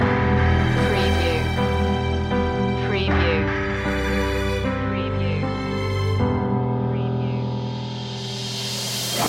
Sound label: car advert music loop breakdown end; Soundtrack / Action / Chase